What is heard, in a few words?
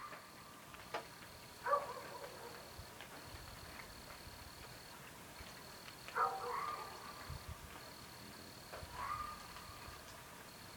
odd call